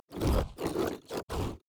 ancient golem bored; LoL; monsters

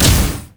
monkeyking spin to win hit big; LoL

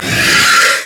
worm basic attack; LoL; monsters

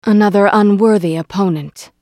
attack; Akali

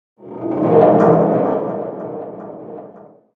big chains groan

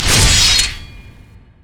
dragon strike; LoL; jarvaniv

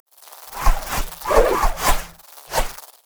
dragon; lulu dragontrainer

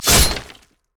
basic attack sword; rengar

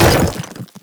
sightward stone; LoL; items

